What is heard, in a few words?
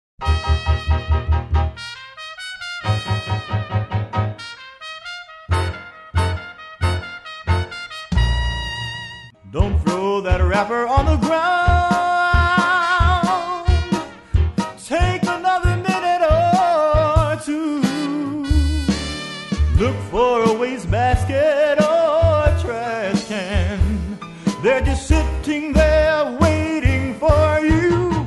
Litterbug Blues